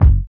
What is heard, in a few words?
RB 100 BD -R, Hip Hop R_n B; USB Soundscan vol.19; AKAI